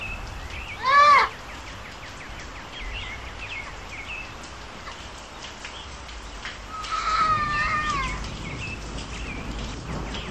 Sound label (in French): Orage commence dans jungle; Orages